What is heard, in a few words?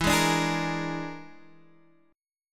A sharp 7th Flat 5th E